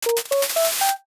fast forward ribbon

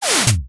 starter camera transition

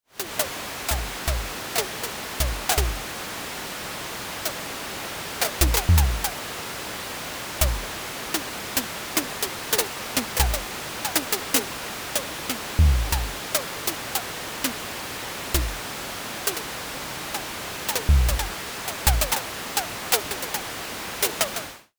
fireplace soft

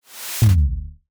bass drop switch

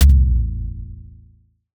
oneshot deep impact room; video editing

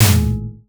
horror sting

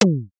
branch snap small

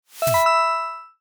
sharp title shine

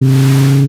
phone vibrate short

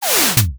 transition glitch

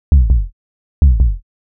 horror heartbeat slow

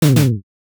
dog bark low

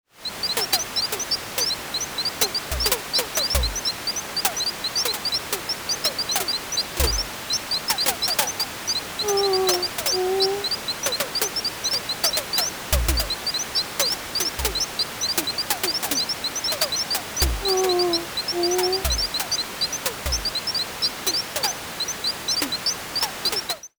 camp night soft

